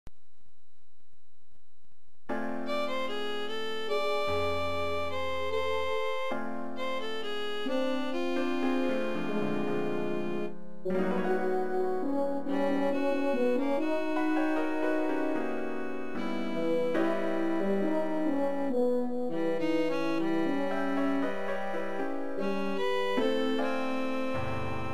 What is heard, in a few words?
horn trio opening